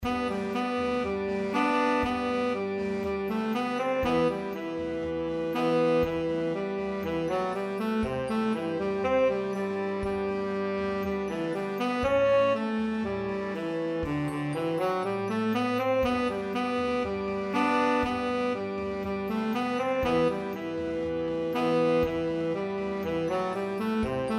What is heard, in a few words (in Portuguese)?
sax tenor bb